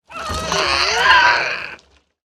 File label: Tar Slime Death